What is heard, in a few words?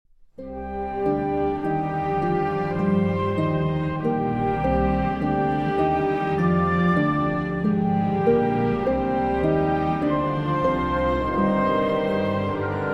Harp Concerto, Andante; Rota